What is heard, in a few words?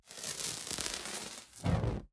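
fire whoosh small torch delicate sparks; soundmorph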